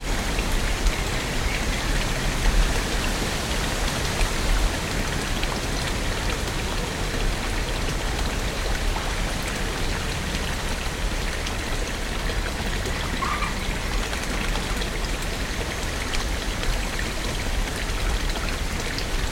nature ambience stream birds wind trees distant plane